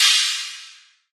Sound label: Crash